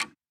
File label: clock